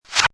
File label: DRAG; MOBICLIC127